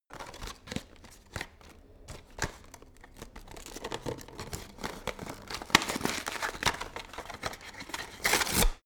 paper rustle and rip

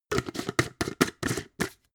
jar glass plastic close fast

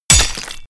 sword impact gore

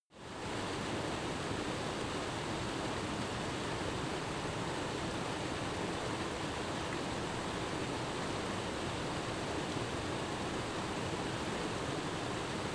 stream rush close

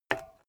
bmx bike pedal foot hit soft

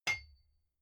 hammer rock hit

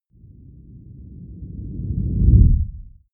whoosh low slow

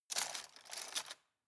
cutlery plastic drawer rattle

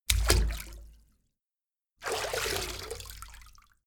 walk foot in out

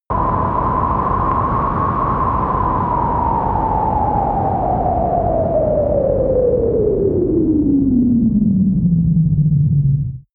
eerie wind fall plane

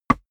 step walk wood solid

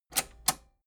metal button robust spring click enable slow

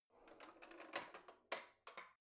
old drawer creaky slide softly out

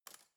cutlery spoon drawer grab

